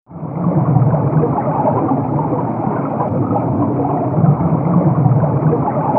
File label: UNDRWATR; SoundFactory; FX